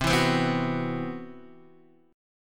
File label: C Major Sharp 11th, Guitar DADGAD